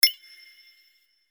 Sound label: accent